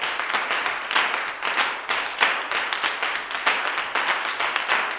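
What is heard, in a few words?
CLAPS 96, Gospel; Blues & Soul; G.CLAPS 96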